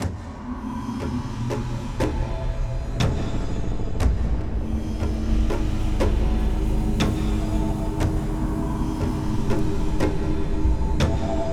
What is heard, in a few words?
TAIKO; Keil Sound Composition